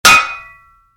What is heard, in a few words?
Spade hit, Shovel, Impact; Yard Tools; Stuart Duffield